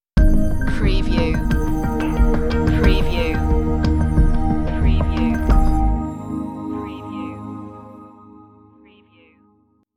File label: hidden beauty stinger